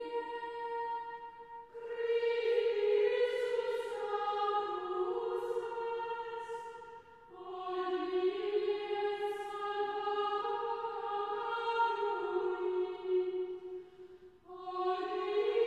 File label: Chant Antiphon; Traditional; Chester Processional